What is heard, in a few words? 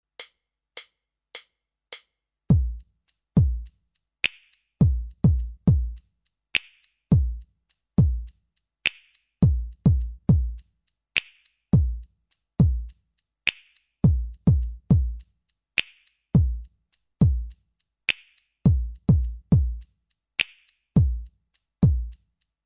Boss DR 01S